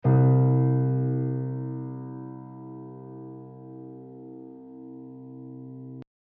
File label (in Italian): Do1 new, Ascolta il martello originale DO 1, al quarto livello di sollecitazione meccanica (f)